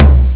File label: tomtom; Amiga Soundtracker sample